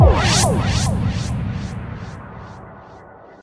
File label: FX Fill, 140 BPM; Vengeance Samples; Song Kits